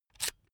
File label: electric drill template adjust heavy out; maschinen; bohrer; soundpool